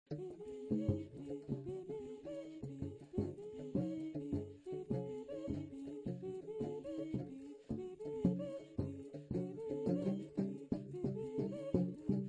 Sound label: VOC FLUTE; Live Aufnahmen